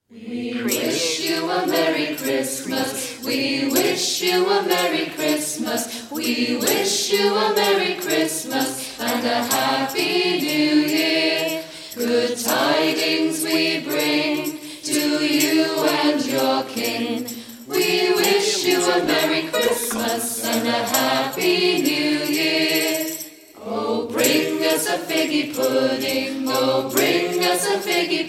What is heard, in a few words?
we wish you a merry christmas choir christmas jingles